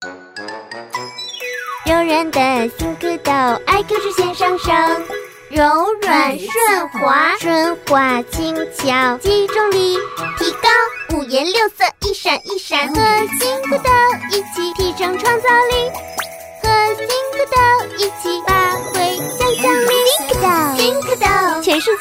중국여 노래샘플

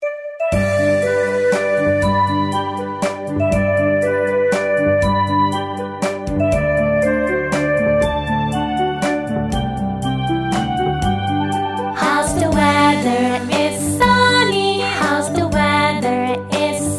영어 여 동요; HowsTheWeather

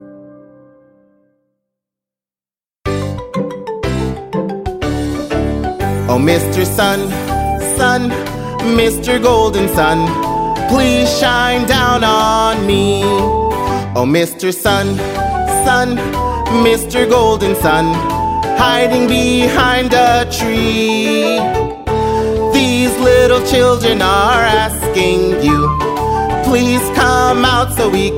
영어남 동요; 남자

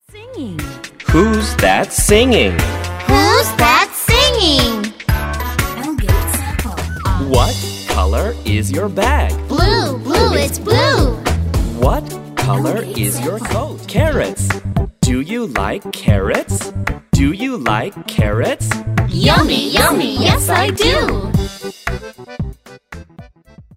영어남 Chant

